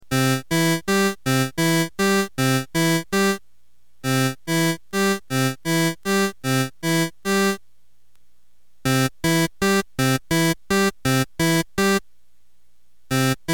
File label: Staccato, Gibson organ; Staccato/Manual Attack